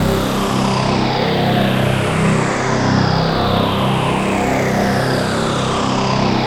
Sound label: syn, SYNCLAVIER Amb Rezo Wash; Retro Sampler